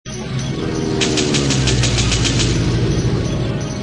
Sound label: plane